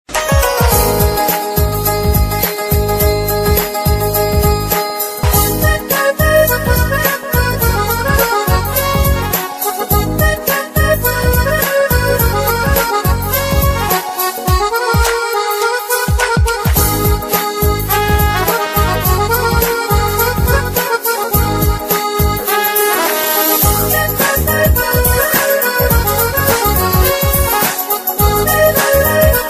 Old Bollywood

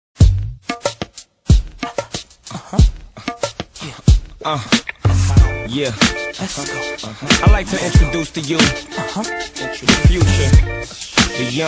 Iphone4 Skate Music

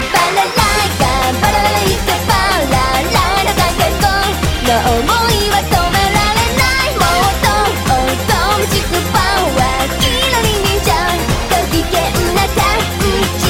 Balalaika

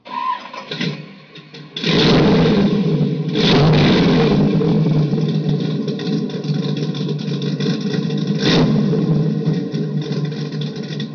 Muscle Car Idle

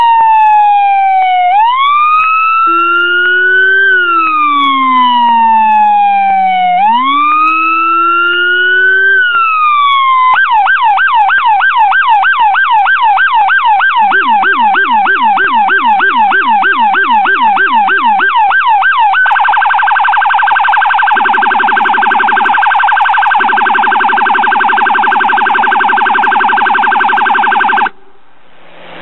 Alarm

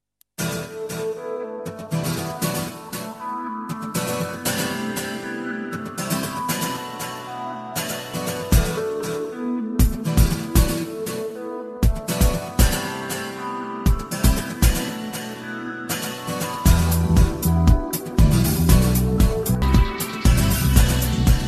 Guitar

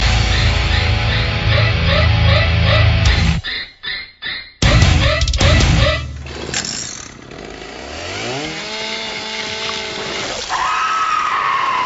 Horror

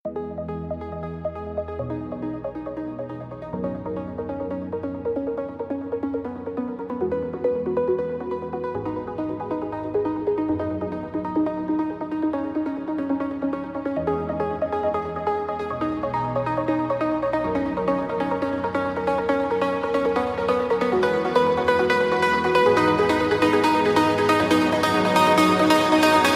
trance pluck melody

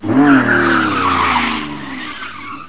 Bmw Exit

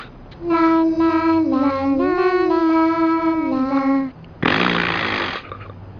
Snorin

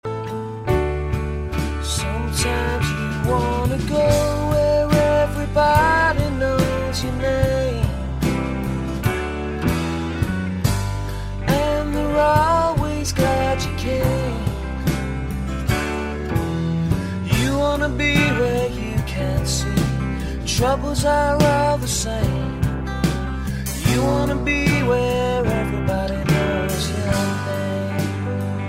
Cheers

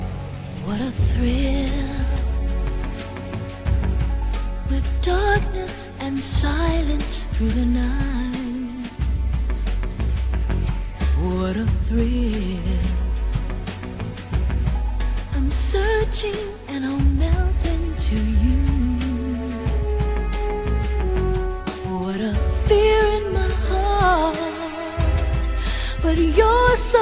Metal

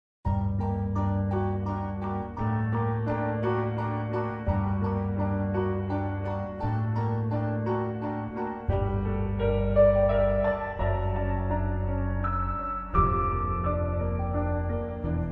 Calm

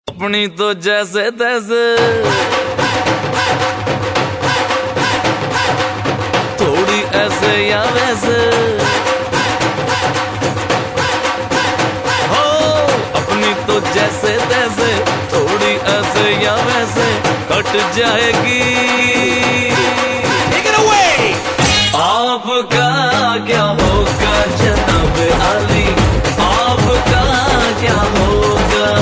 punjabi

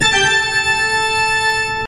Halloween organ mms

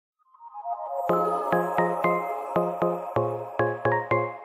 chill text message

